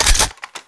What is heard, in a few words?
mag in, weapons